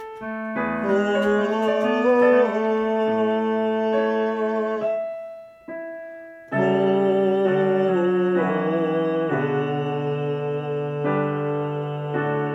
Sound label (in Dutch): zang